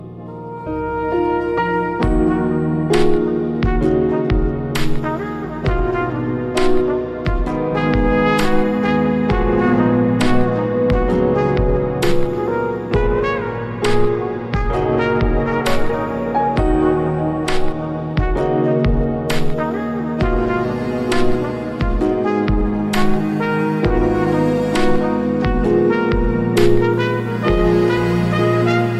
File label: Lonely Saxophone